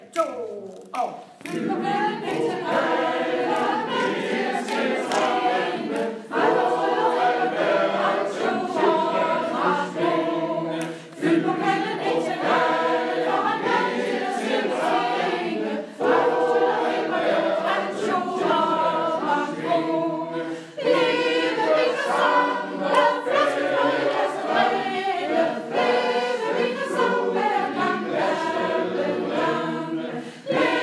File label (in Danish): tourdion alle stemmer